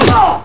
Blow

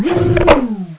servo

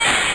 Sound Gun Down; Funhouse of Love; shootoff

